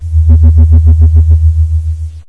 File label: radar; Kenney's Sound Pack; Retro Sounds 1